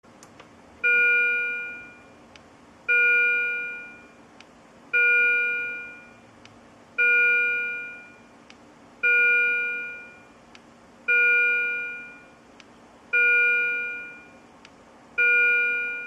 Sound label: Philips Yellow Alarm